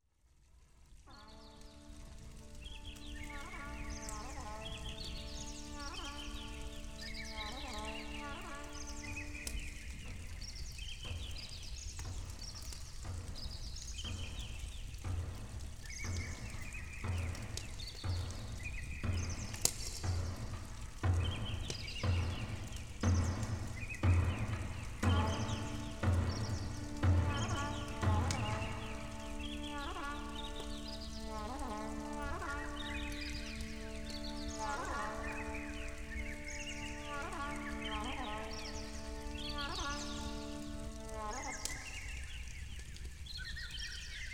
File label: War Drums; Blue Forest Story